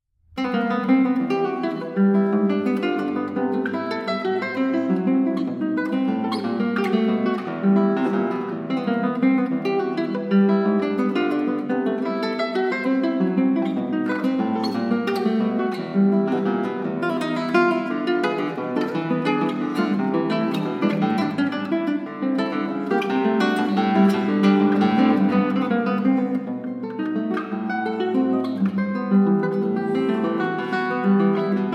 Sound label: Natalia, classical guitar; Lauro